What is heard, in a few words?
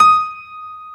Stereo Grand, Keyboards